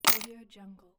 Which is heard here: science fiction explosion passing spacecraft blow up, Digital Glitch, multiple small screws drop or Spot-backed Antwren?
multiple small screws drop